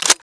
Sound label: spas reload pump up, weapons, SPAS12